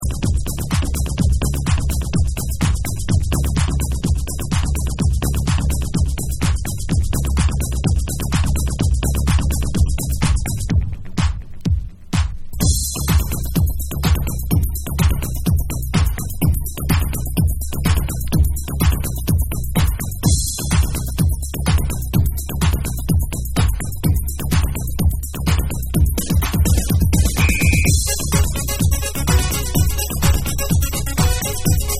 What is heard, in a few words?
Dub